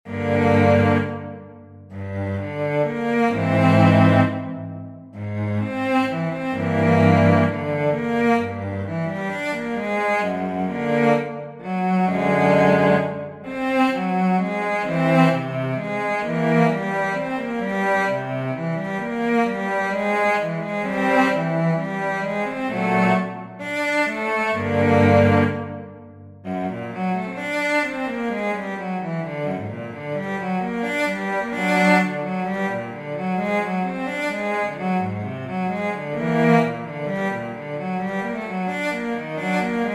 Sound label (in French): breve pour violoncelle